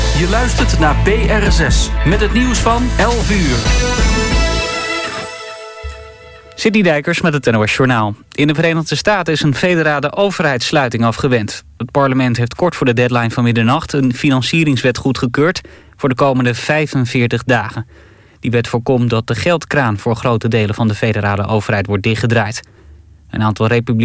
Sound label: Jazz